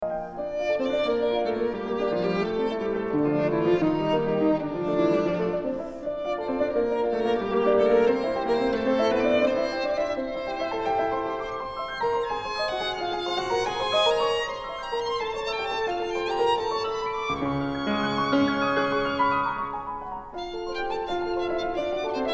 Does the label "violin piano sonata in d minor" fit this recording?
Yes